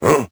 hm; Crypt of the NecroDancer

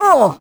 squish; Crypt of the NecroDancer

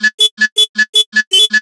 horn; TrackMania Nations Forever; Skins

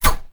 throwable thrown; Crypt of the NecroDancer

